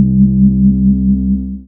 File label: Single Electric Basses, Dance Floor Bass